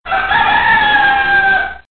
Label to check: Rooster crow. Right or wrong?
Right